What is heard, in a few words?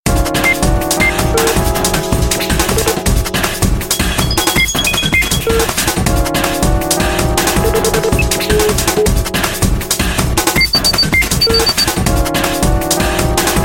Speed Tones